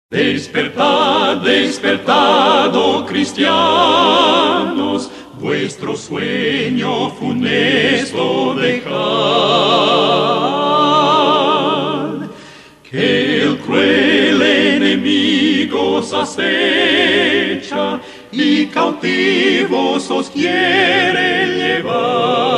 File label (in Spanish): vocal; Despertad Despertad Cristianos